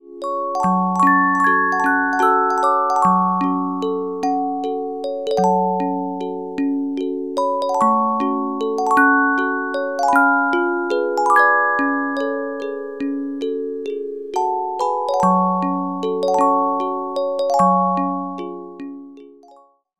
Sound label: Carillon Music Box Melodies